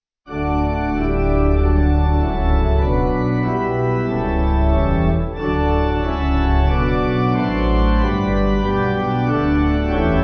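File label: Sanctus Acc Pipe, Pipe Organ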